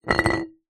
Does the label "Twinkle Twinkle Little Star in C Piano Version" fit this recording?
No